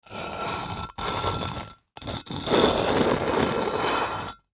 Stone Drag Object Statue On Cement; Grind Rock; Dragging Sound Effects; Foley; Blastwave

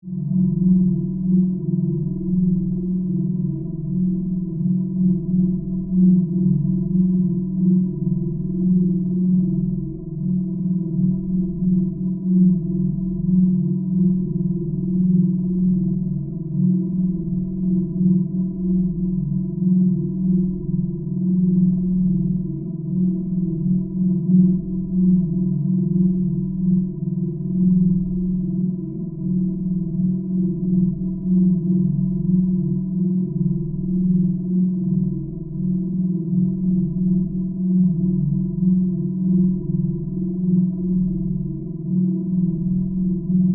Spaceship Alien, Interior, Hallway; Spacecraft; BlastWave

